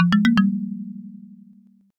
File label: grave jingle; gbjam12